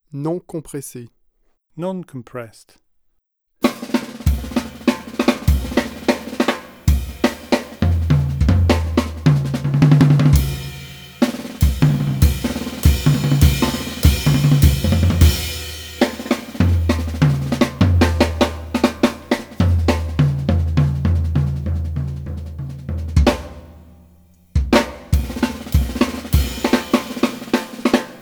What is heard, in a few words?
Uncompressed drums